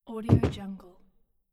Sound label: body fall